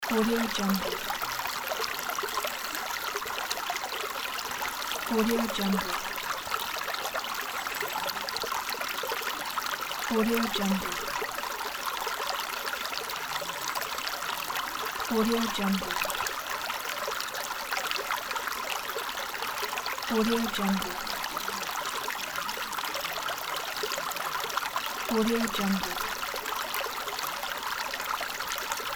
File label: mountain streams loops pack